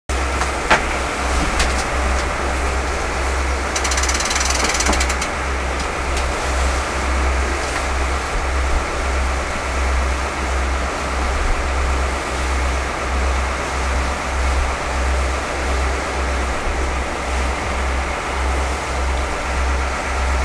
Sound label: boat steady on board; best service Blue Box; sea traffic